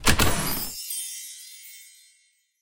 Loot Chest; BTD6; Tiletype

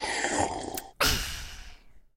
Prop Sip

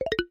UI Editor Undo

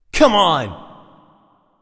Activated Rapid Fire; BTD6

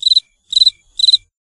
Crickets; Emote Sound

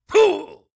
Damage; Striker Jones

